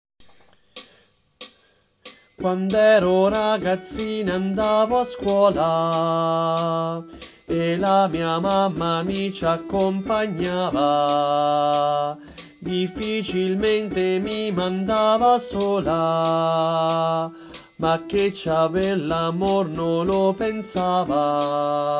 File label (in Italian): Soprani, coro; Cecco mi tocca